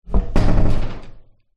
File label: public places public toilet shed wood door close vibrate